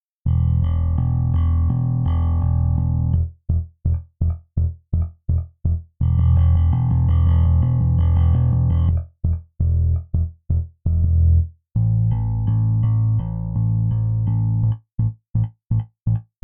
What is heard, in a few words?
bass default setting finger